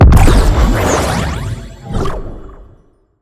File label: Shield and Bubbles